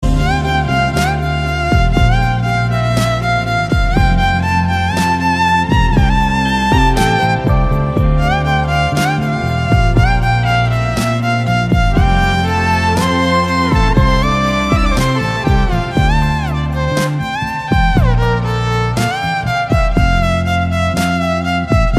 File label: Violin